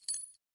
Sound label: ting